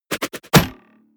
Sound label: knife throwing hit